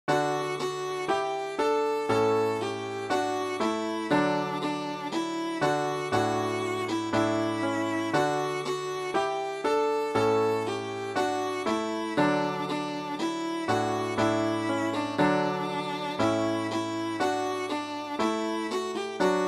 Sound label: ode to joy viola